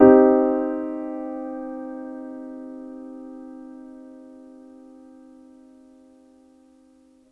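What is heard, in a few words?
Piano Grand minor soft; TrashLibrary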